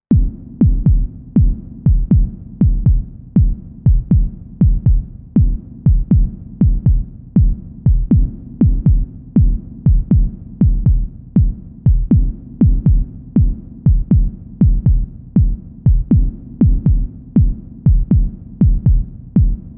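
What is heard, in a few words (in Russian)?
тревожно и монотонно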